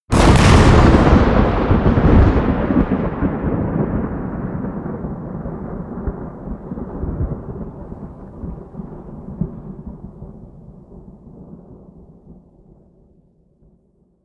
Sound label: generic thunder oneshot close